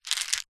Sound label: food candy sweets mint lozenges in plastic tub short movement